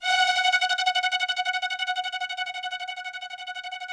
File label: ROUGH STRNGS, Yamaha VP-1; Music Store